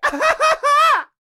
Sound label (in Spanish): Grito; large win; colossal pinatas